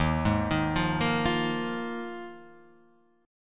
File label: Eb Suspended 2nd Suspended 4th, chord; Ebsus2sus4 arpeggiated; JGuitar